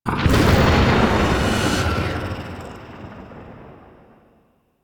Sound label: Corruption UI Fracture Open; Warframe; Missions; Zariman